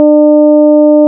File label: Brake Vibs